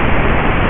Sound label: 8bit Pink Noise